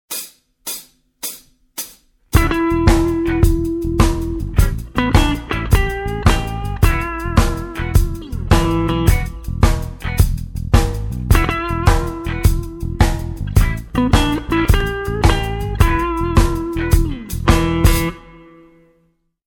blues jazz

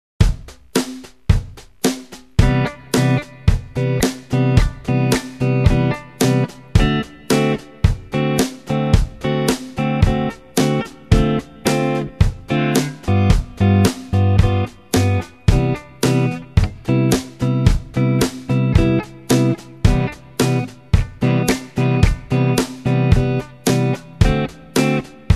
Rhythm Guitar

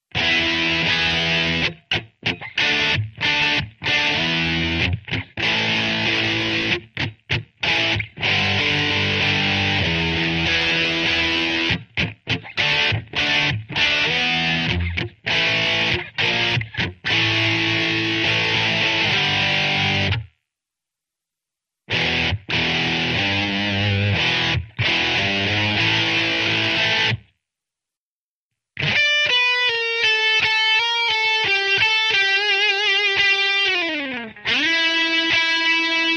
Rock guitar; Total Rock gt

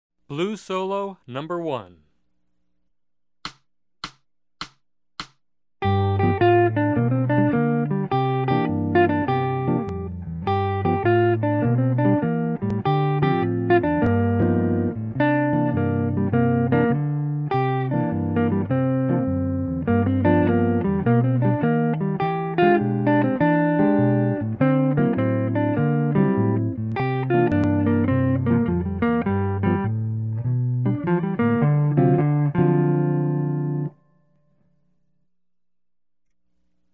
Jazz

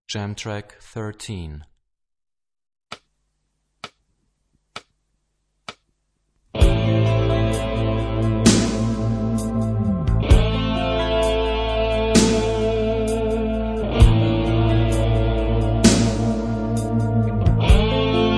Rock guitar; Rock gt Secrets

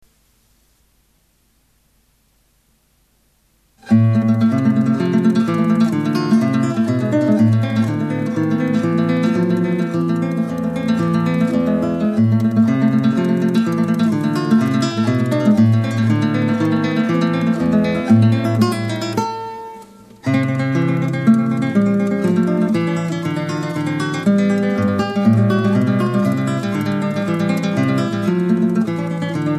Etude, guitar

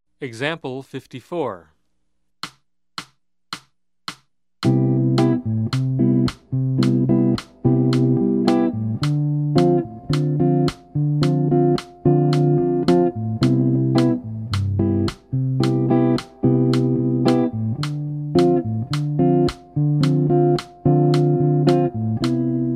Jazz

